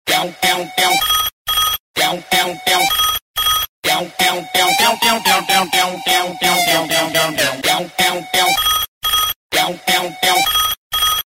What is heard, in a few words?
Twak Twak Desi Beat